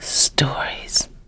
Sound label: Spider